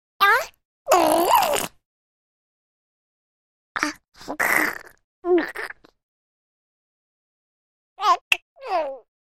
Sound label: Coos Baby Various